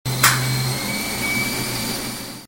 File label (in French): Lave linge